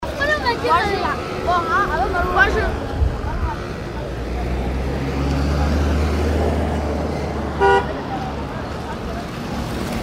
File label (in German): strasse mali